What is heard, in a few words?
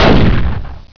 explode